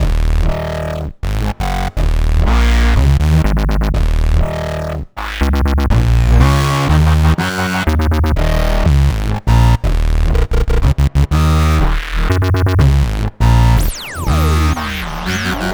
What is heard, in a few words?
122 D#m Full Mix, Complex Electro Synths; Invader; Prime Loops